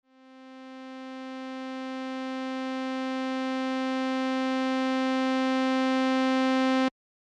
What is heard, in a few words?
ADSR; synthese sonore les enveloppes